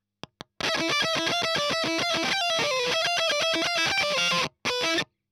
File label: EL Fuzz End